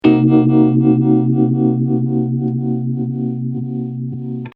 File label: swing